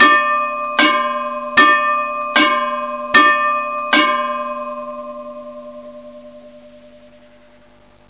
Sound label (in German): Stationsglocke